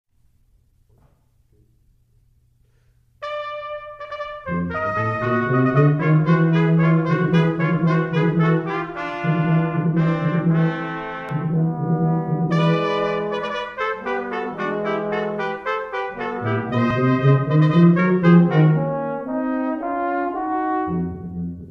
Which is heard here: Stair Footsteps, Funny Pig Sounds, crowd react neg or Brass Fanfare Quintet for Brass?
Brass Fanfare Quintet for Brass